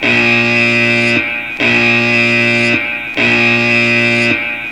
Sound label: Alarm